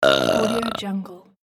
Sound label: cartoon burp